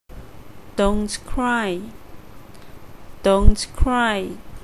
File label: D cry